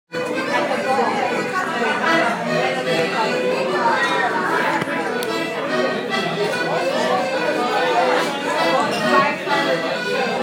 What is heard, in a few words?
edinburgh pub session